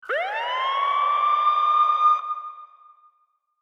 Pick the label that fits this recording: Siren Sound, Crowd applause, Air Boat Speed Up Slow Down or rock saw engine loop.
Siren Sound